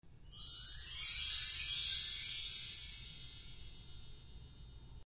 intro outro sfx; Horoscope

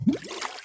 gambling circle hover fast; Hungry Shark; Wazdan

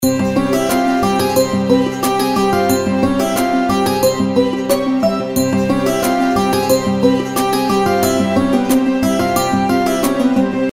intro bgm; Egyptian Mythology

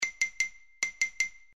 farm countdown; games; Farm Mania; Happy Farm

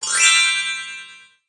freespin end melody; Hot Diamonds; slot game

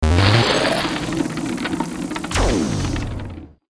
animation turbo; games; Bingo

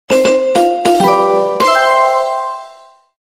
celebration short; Power Stars

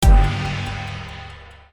count up end; Sakura Fruits

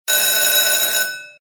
RING SHORT; Just Jewels

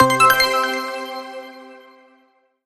multiline win; Magic Stars 5; Wazdan

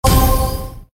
bonus chance; WolfMoon

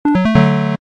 gamble win; Queen Of Nile

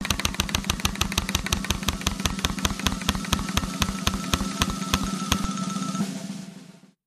bonus chance click wheel; Fishing For Gold

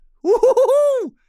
laugh; Racetrack Riches; megaboard

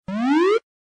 GAMBLE WIN; Gorgeous Goddess

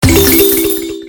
bonus chance; Magic Forest

